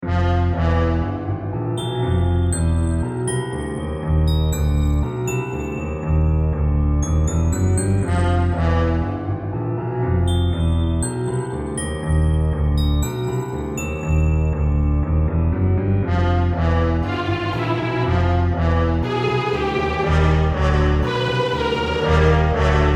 Cartoon Music Spooky Adventure

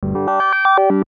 BLIP SWEEPER; Beep; Multimedia; Beeps

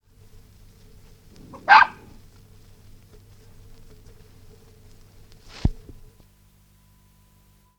Maltese Terrier barking; Part of Dogs (Sound Effects:Animals); BBC

